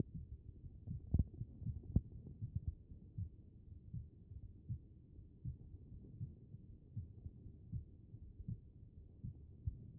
HEART; B MODE

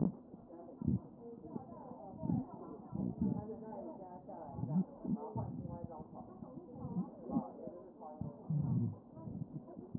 CHEST pos1 D MODE

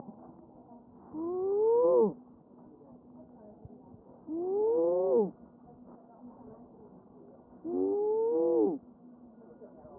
chest

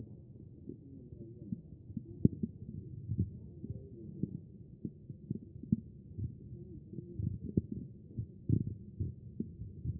CHEST; B MODE

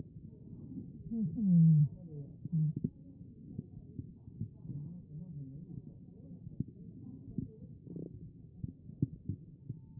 A CHEST; B MODE

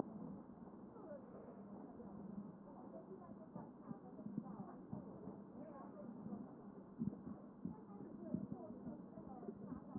CHEST

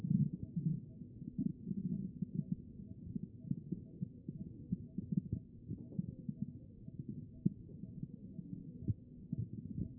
CHEST pos1 B MODE

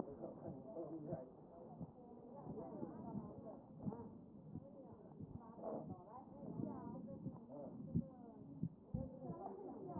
A CHEST

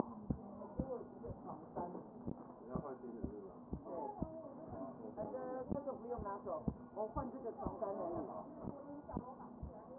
CHEST pos4 D MODE

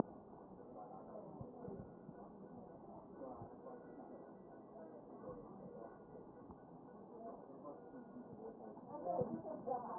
CHEST

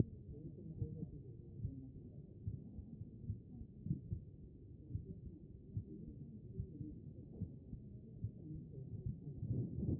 chest; B MODE

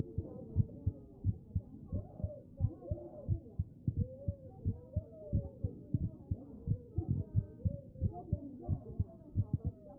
CHEST; B MODE

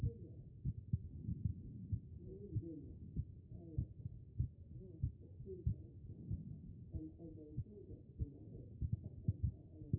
HEART, B MODE

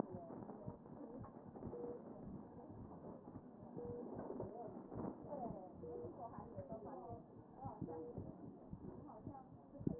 A CHEST

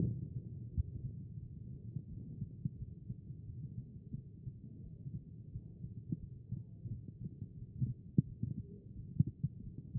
chest; B MODE

